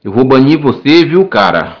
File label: berimbau